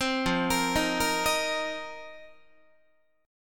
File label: F 7th Suspended 4th F down Guitar; arpeggiated